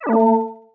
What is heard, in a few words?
UI Zoom; knights try